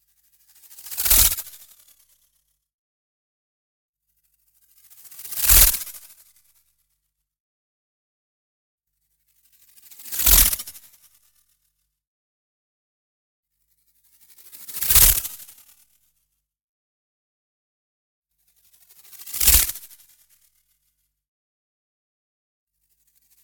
NEON WHOOSH SMALL FAST; Tiny Flash; boom library

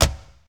Porsche 911T Door Close; Automobiles

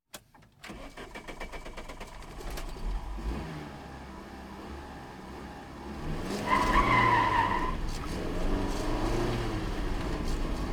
Automobiles various

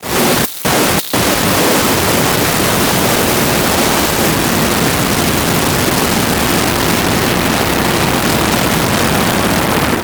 Static; Blastwave

